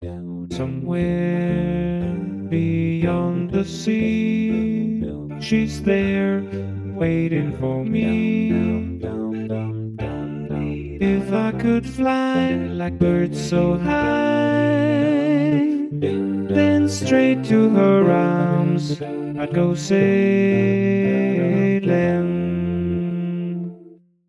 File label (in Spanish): voz cantante